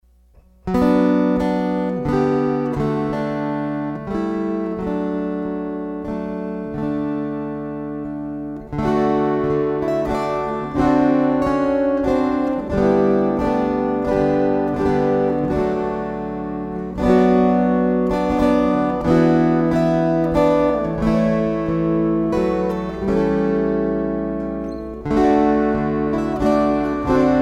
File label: String Choir